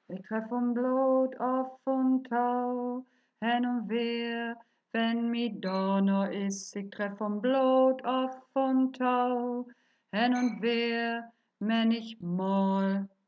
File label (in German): Refrain Alt